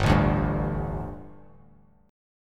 G Suspended 2nd G, down, Bass Standard; Gsus2 strummed; JGuitar

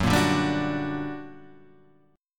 G Minor Major 7th, Gb, guitar chord

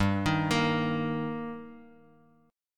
G Minor Sharp 5th G, Guitar, Open F; arpeggiated; x,2,3,5,x,x; JGuitar

